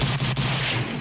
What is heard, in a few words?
triple gun fire; sfx